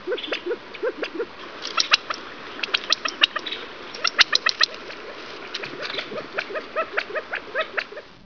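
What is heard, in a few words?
california quail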